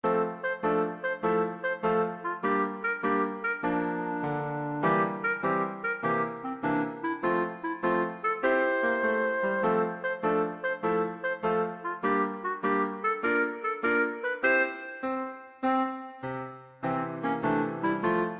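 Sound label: clarinet; funny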